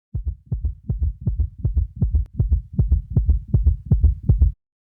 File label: Heartbeat; Drifter; Warframe; Cinematics; Duviri Quest; Courtyard